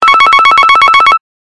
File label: Ringer